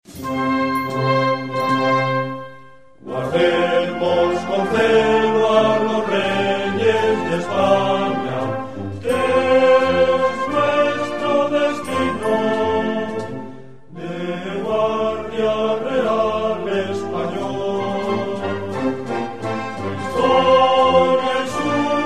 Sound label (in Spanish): himno